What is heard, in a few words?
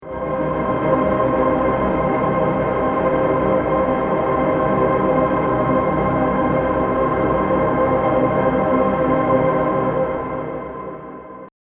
space